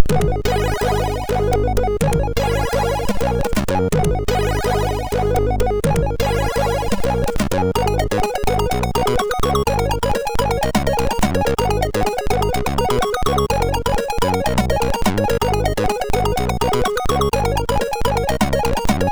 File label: Note Music, MOS6581R2